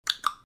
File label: Cluck Cluck